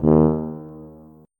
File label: tuba note